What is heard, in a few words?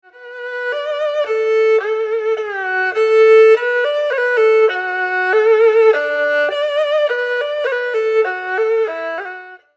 strings